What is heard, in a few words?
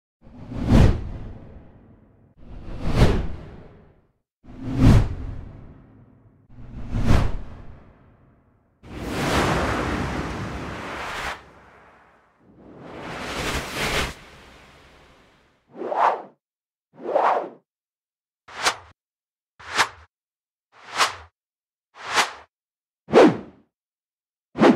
whoosh sound effects; FRANCE3; PETANQUE; HABILLAGE